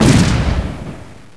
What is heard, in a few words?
Cannon Close; abbottabad compound